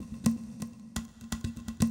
PERCLOOP ETHNO 125

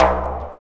stroke, ETHNIC STUFF

